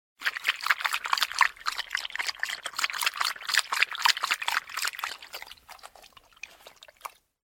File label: schlop